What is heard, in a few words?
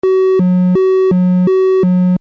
lfo squ; nord3